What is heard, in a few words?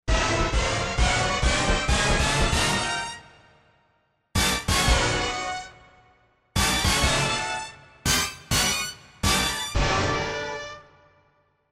Sus. Band Hit, Korg TR-Rack